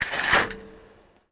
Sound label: rollo retract, volvo 9900; Vehicles; AI volvo 9900; Freyfurt